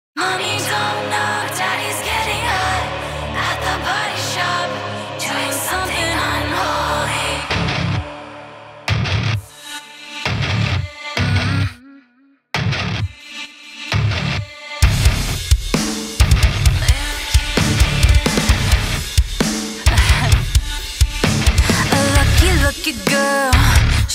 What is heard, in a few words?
metal cover